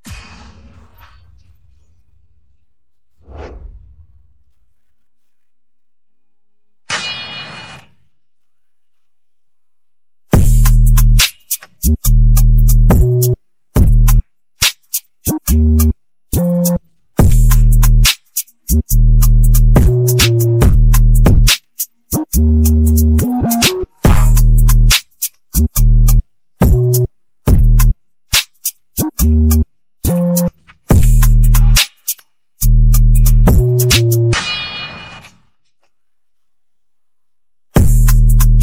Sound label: no vocals; htdemucs 6s